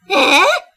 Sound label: jap huh